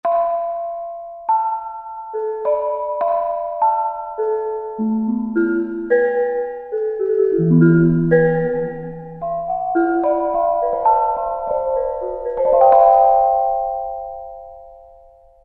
MALLETS - BONANG; Spectrasonics Heart Of Asia

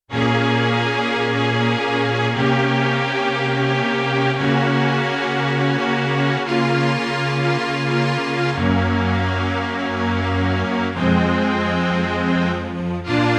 Filter Strings; Yamaha TX16W; Orchestral Performance 1

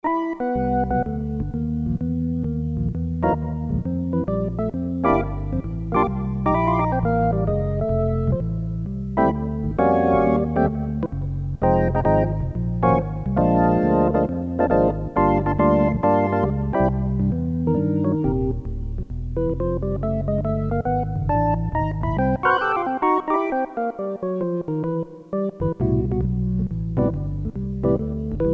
ORGAN, Korg DSS-1; Multiple Inst

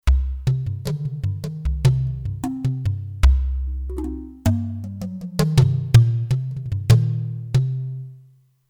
Touch Drums, Kurzweil K2500X; Factory Programs

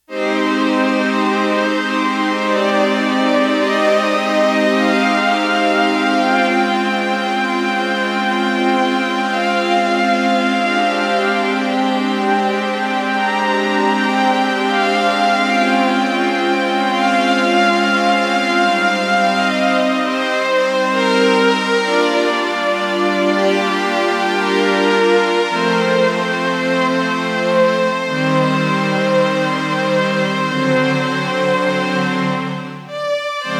Syn Strings, Roland JX-1; Factory presets; Additional factory patches

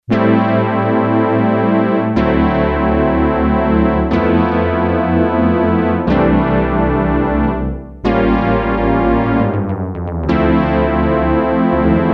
Pitcha Pad, Yamaha TX802; Factory Patches; Voices

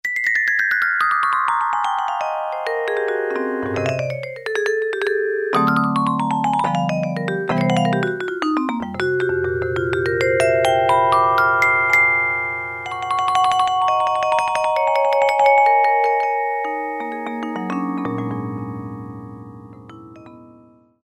Hard Vib, Roland U-110; Factory Patch

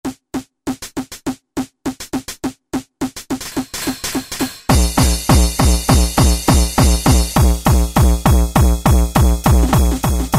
Gabba SET, Roland SR-JV80-11 Techno Collection

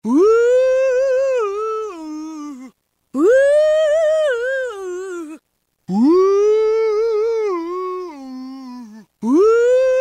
Whoooooooo; Roland M-DC1

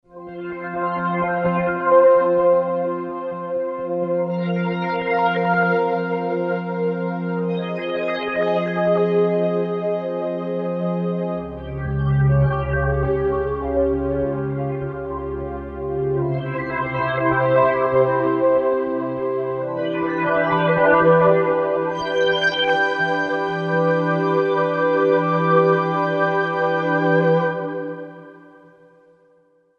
Wavesweep, Korg M1Rex; Factory Patches

